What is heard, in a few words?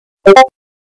Discord Message Loud